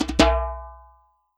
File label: drm, WORLD DRUMS; World Instruments